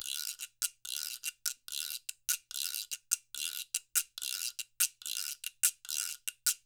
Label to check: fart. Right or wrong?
Wrong